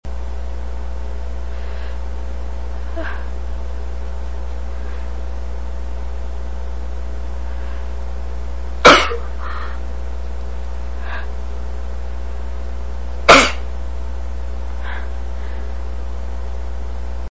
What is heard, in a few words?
emission, female